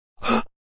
Man Gasp; A man gasps.; Gasping

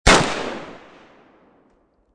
AK47 Field, Assault Rifle; Field Fire; Assault Rifles; Guns; VRSonic

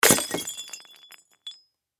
Crash Glass Drop Hit Break; Sound Effects:Materials; Blastwave

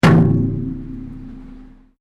METAL IMPACT BONG; Materials

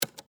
Adding Machine, Office, Adding, Machine, Button

